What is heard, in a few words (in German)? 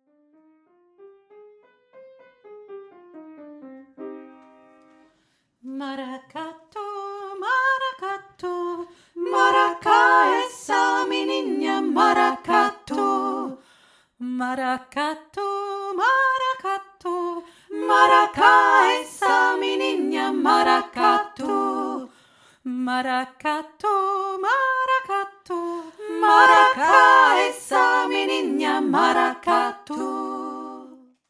mara ka tu dreistimmig